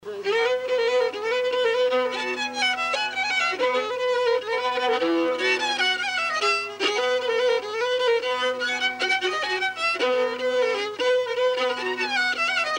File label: polka mazurka